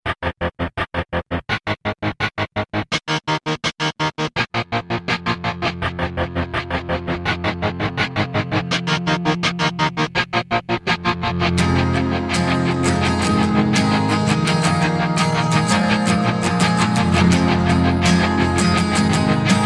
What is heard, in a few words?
rock remix